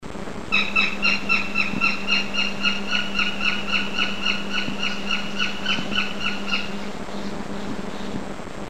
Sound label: bird